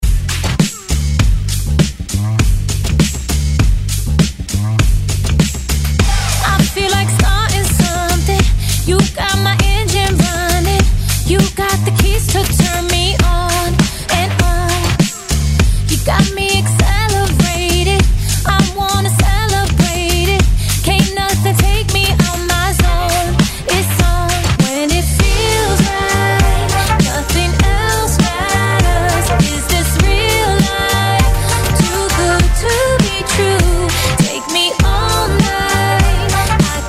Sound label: Club